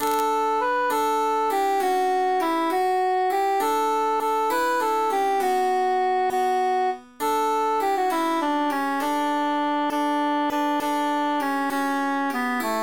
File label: transposed cantus emphasized